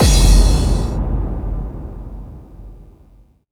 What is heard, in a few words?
BD-FX, Dance Mega Drums; Best Service